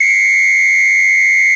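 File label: Samba Whistle Medium; Latin Percussion; Drums; Drum Hits; Ableton; Factory Packs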